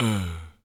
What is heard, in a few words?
VOCAL UH; ILIO; Synclavier Strings